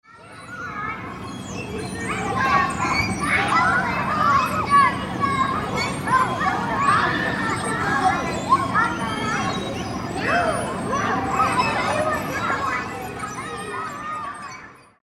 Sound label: Playground Sounds; playground sound ambience